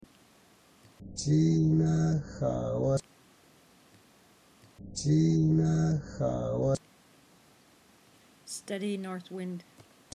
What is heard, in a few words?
steady north wind